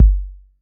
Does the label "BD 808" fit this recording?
Yes